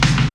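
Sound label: bass drum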